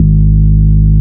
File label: Ultimate Bass G# Root G#; Vengeance Samples